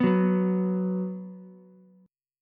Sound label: B 5th B Ukulele, chord; strummed; 4,6,7,x; JGuitar